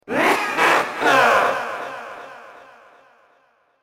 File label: CREEPY CACKLE